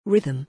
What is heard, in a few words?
rhythm